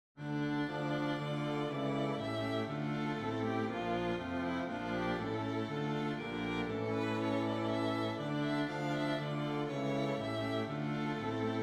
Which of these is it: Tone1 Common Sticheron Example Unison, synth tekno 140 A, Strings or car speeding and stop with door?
Strings